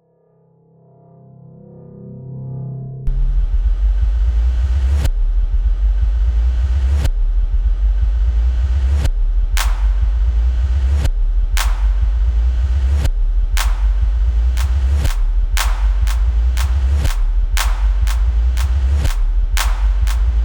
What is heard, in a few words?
Suspense Intro